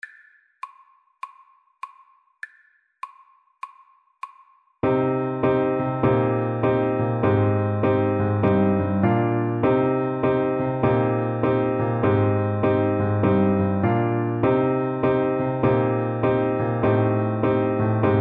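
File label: alto saxophone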